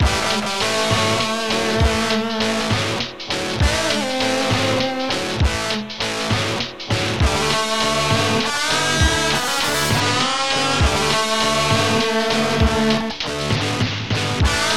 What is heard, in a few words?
blues